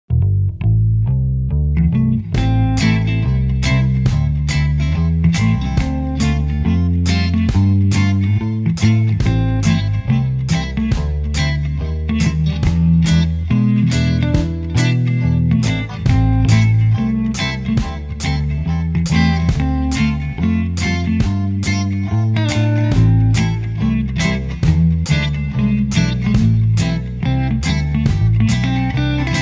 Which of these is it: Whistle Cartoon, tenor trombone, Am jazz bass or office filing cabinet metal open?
Am jazz bass